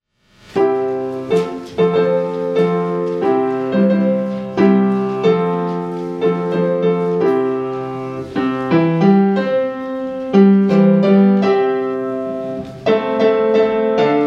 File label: hymn; To Us a Child of Hope is Born